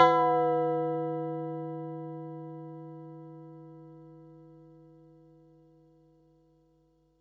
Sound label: Perc, Percussion and FX; Pearl SC-40